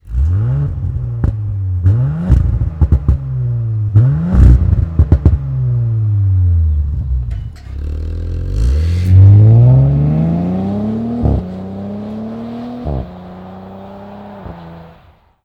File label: Audi S4 Typ B9 Axle Back System; REMUS